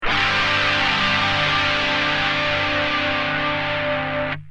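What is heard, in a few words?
guitar tc; tc1300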